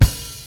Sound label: old school kick drum sound F sharp key